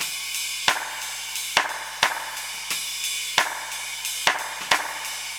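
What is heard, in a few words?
89 bpm, beat loops; Zero-G; Monster Beats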